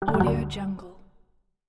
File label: Error Exit Game